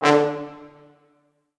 D2 L, Orchestral Brass

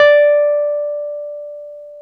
PNO WEPD, PNO Wurly; Roland LCDP10 Keys of the 60s and 70s 2; Wurly 200

